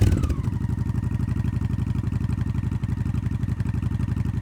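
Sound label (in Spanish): RALENTI IN YBR, motorcycle; YBR125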